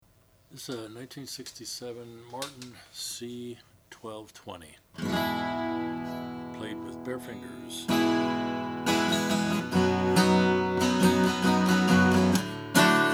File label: cf martin d 12 20; natural